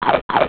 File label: insect; G-Netix; ISM